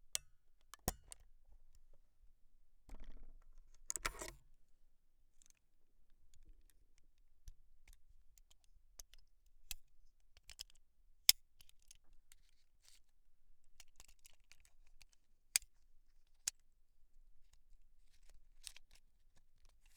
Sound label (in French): CHARGEMENT FILM